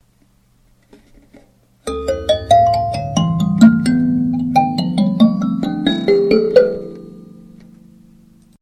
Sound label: kin D, Kalimba; silvermooncreations